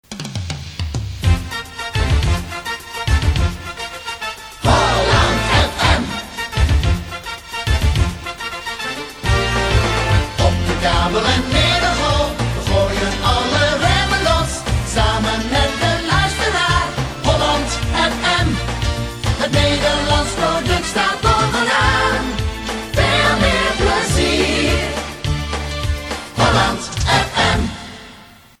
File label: Holland fm jingle; keizerstad